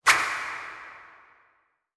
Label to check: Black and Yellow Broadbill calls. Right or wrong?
Wrong